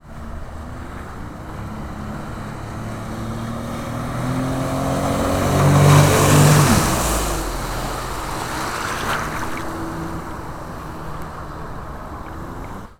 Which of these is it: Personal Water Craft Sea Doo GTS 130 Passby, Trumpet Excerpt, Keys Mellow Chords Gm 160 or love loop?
Personal Water Craft Sea Doo GTS 130 Passby